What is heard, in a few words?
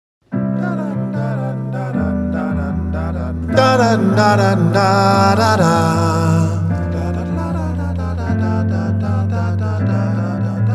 coda bas